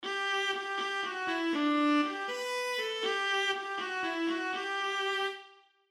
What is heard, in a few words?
Alto Clef Example Viola